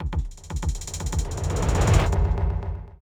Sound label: Bumper